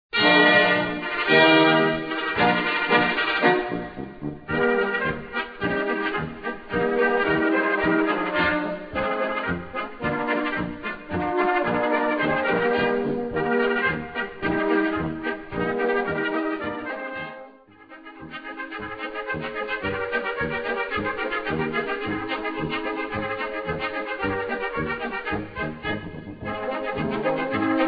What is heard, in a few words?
POLKA; BENFELDER